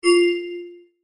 photon Emit Microwave; molecules and light; greenhouse effect

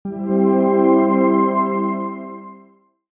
start Sunlight Chord; molecules and light; greenhouse effect

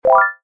selection Arpeggio

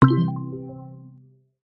level selection button

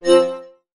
photon release microwave; molecules and light; greenhouse effect

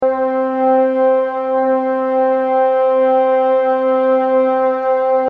winds Loop Middle C Oscilloscope

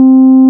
saturated Sine Loop; gravity force lab

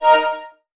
photon release visible; molecules and light; greenhouse effect

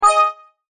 photon Release Uv; molecules and light; greenhouse effect

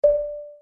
bonk; Plinko

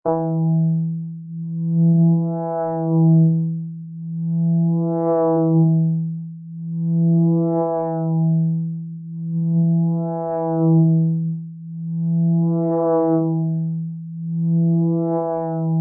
rotation Clockwise Slow Motion; molecules and light; greenhouse effect

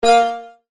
photon Release Ir; molecules and light; greenhouse effect

